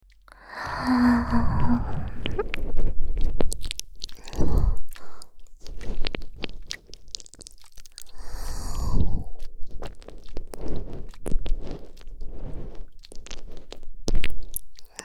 超・超!!ぐっぽり耳舐め!!; ドスケベ婦警さんのエッチでドSな取り調べ♪

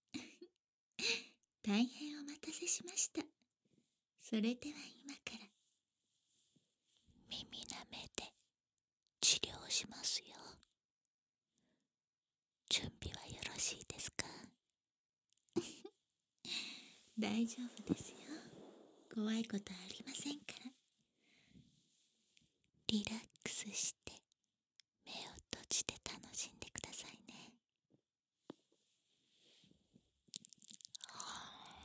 癒しのお耳ぺろぺろ; とろとろお耳クリニック

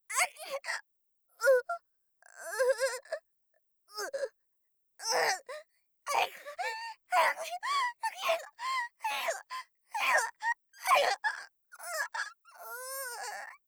あひゅ！; 効果音なし

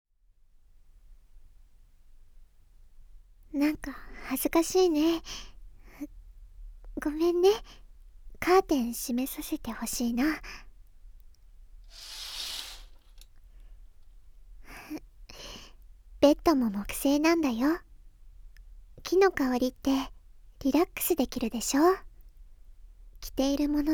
ぐっちゅり快感耳舐め手コキ_効果音なし

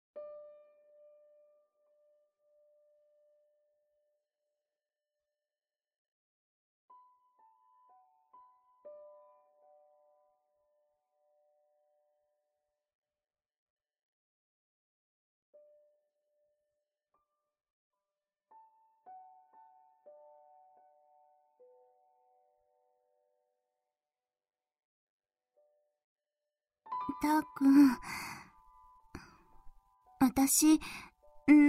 BGM; ももいろM体験版

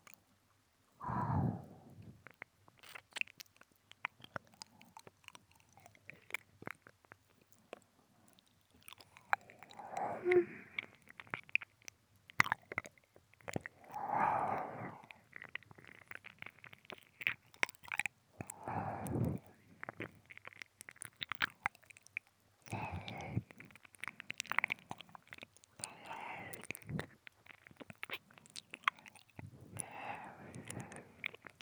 耳舐めだけ

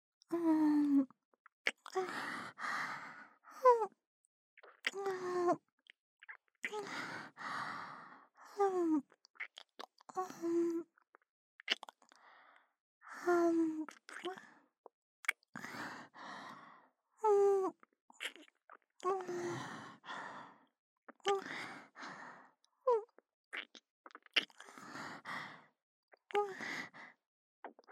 キス

